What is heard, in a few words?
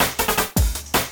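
loop snare drum, drum & bass, 160 BPM; Ueberschall; Drum & Bass Resonance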